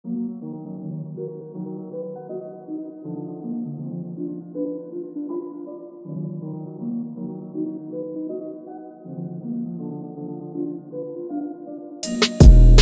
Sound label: good beat